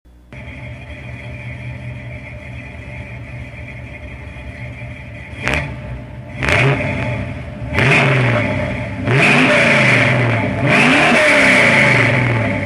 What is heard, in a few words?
TRUCK